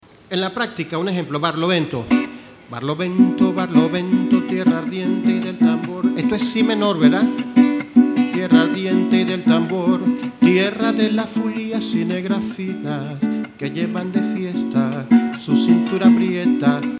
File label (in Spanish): Sonidos Cuatro Barlovento